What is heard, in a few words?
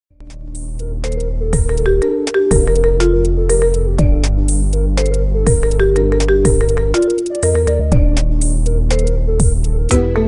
marimba remix